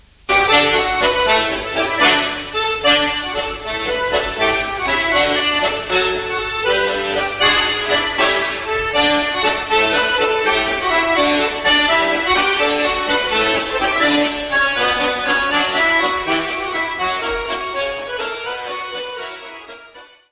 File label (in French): Belgique